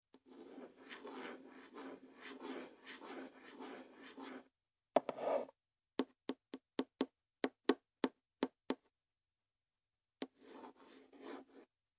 wood; 木材を爪でいじる音

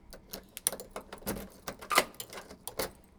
door; 鍵を開ける音

